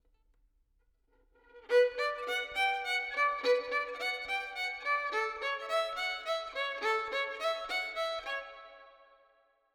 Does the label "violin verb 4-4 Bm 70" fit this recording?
Yes